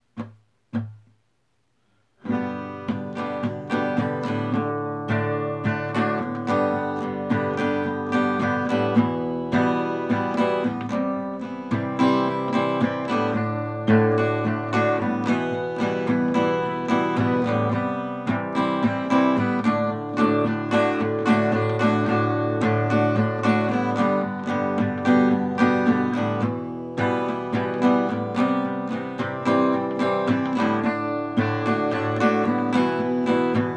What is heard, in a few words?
acoustic; brick and mortar; blue bayou cafe